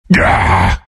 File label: bearform anger; Lone Druid